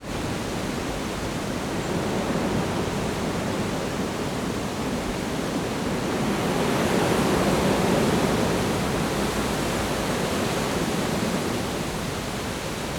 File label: nature wind trees strong park